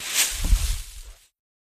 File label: pop up show; Wild Ape